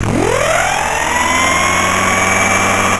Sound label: ship body open; Earth and Beyond; EA Games